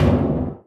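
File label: panels impact small, metal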